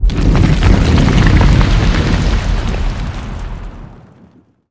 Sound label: Stair Fall